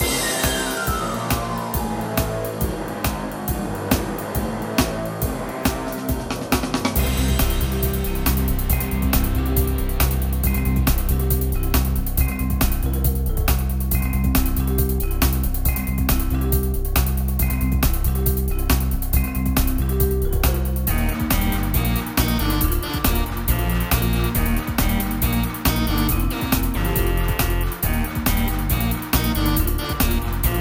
Lose Race; crash nitro kart gamerip 2003

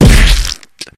Kill Hitsquish; Friday the 13th: Killer Puzzle

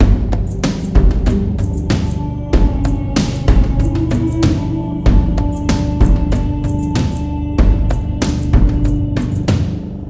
sounds music; Halo Combat Evolved; Xbox

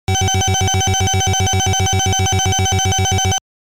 Counting Bonus Points; Pengo; arcade

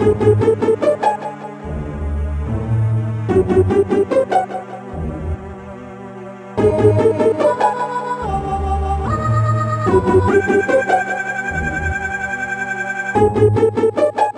Spook Music Cute; Spookware

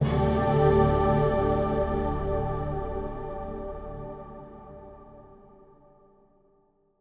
Stingers sting gain

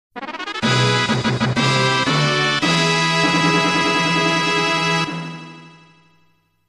Jingles Fanfare; Shattered Hourglass

